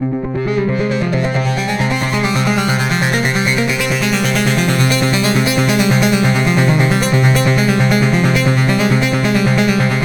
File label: distortion modulation, synth